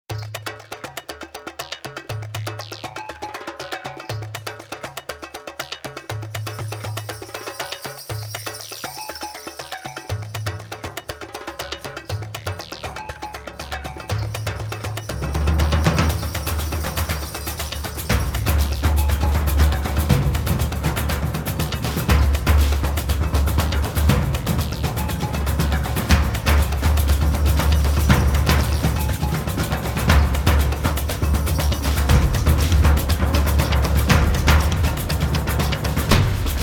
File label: drums only version